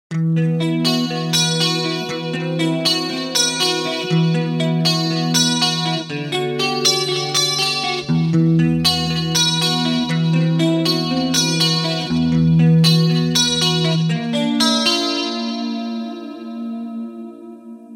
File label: acoustic delay; Micro Cube red